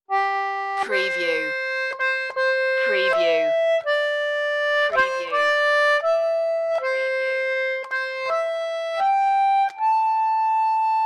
auld lang syne solo concertina

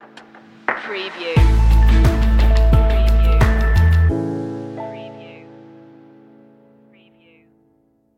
in a warm place stinger

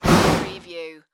Fire Torch Single Blow Out

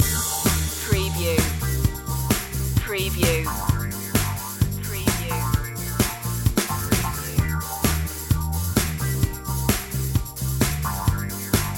boogie disco loop

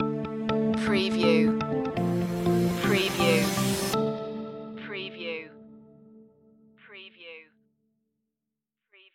ibiza summer stinger

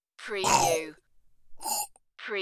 Choke Gag

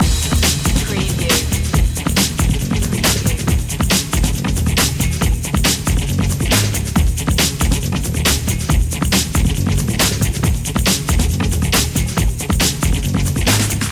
check point loop

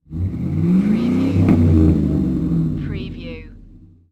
Truck 5.3 Liter Rev Exhaust Perspective; Vehicle / Transport Sounds / Lorry / Truck Sounds

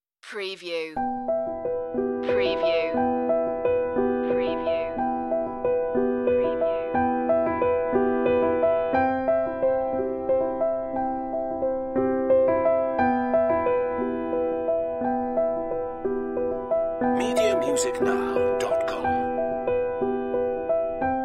piano only

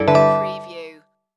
Multimedia Error

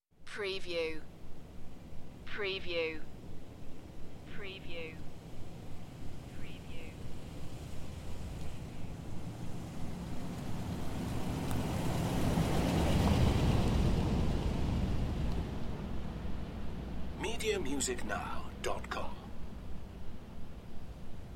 Welsh Forest car park drive by; beanstalk audio

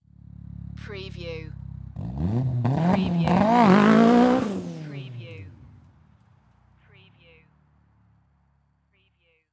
MITSUBISHI ECLIPSE EXHAUST TAKEOFF

